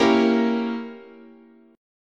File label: Bb Diminished 7th, guitar chord